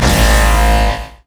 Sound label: death ray; LoL; viktor skin03